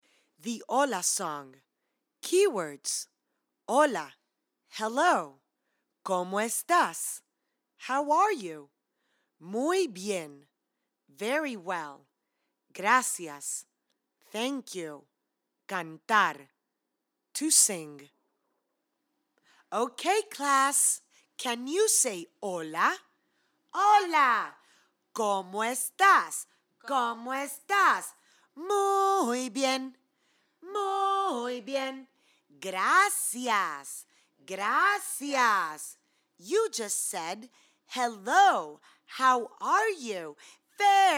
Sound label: Hello Song Spanish; Rituals